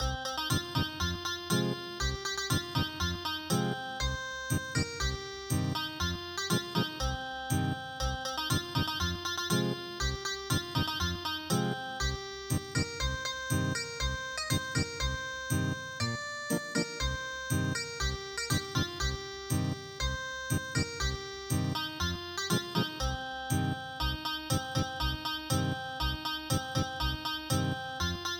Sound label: Ethnic Guitar; gak seor i ta ryeong